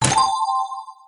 button press; Cartoon Network - Toon Cup 2020

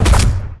Wolf Enter; Adventure Time - Gate Crashers

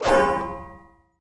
hit gang; Be Cool Scooby-Doo! - It's Dark Out There; ThrillGames

